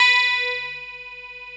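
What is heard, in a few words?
STRAT B4, clean guitar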